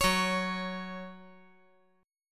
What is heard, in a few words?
F sharp 5th F sharp; strummed; JGuitar